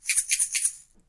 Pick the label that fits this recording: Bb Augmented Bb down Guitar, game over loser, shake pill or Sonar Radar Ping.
shake pill